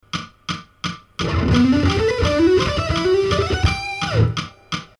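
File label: string skipping fast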